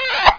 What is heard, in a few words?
kiss